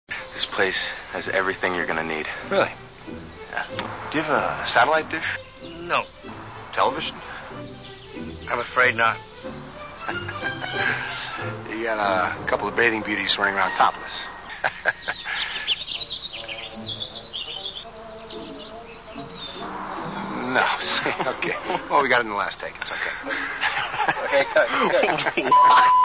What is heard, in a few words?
monks